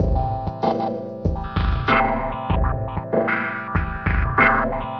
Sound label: 096 crunchy drums n chords; SAMPLED MUSIC LOOPS